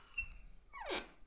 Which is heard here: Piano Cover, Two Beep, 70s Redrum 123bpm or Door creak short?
Door creak short